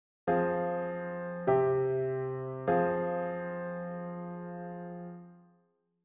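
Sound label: F minor perfect authentic cadence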